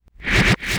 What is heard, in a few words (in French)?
coup de poing